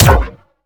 Sciweap Shot Small Blaster Thermal Disintegrator

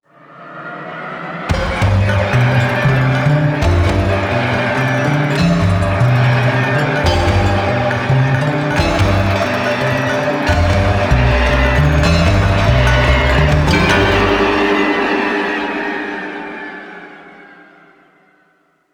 Dolphin Music

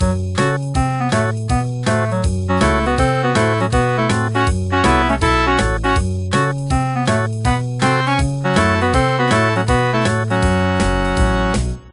Pigs Classic Win

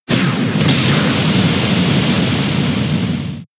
Giga Impact; Pokemon; gen 7; SUMO; USUM

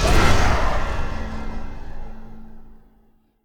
Sound Effect - Dissent Begins

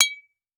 glass bottles clank

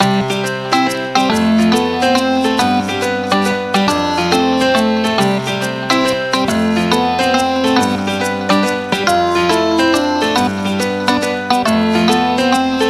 Frontend Music; Story of Noah's Ark; nds

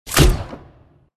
Dwarf Missile Release; Dwarves Boss

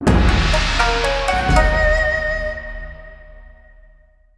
STING; HUB

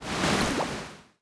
Water; The Denpa Men; Android

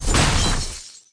Ice; New The Denpa Men

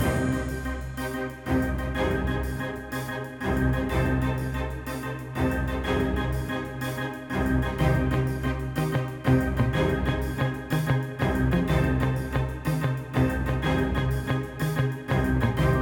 Spooky Battle Loop; Steamworld Quest Hand of Gilgamech; nintendo switch

